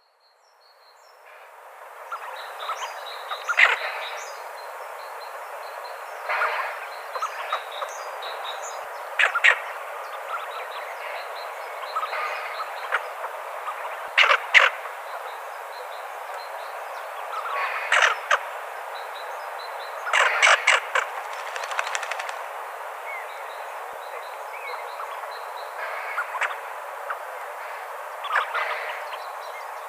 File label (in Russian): chernet hohlataya